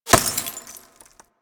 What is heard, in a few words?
Laser Impact Glass Large; Warframe; Weapons; Bullet Impacts